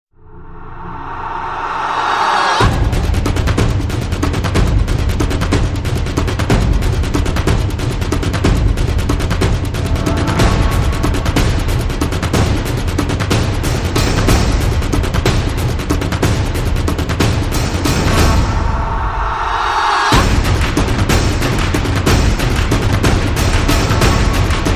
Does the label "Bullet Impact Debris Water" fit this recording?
No